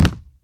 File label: hardwood land; Golden Crayon Sounds